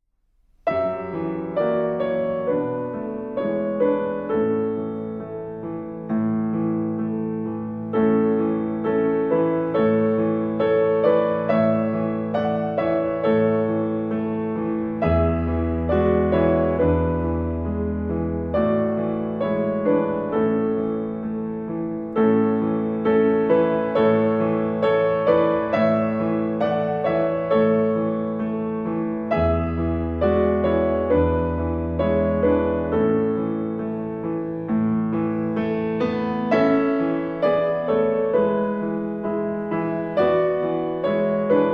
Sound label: Long Long Ago Performance Piano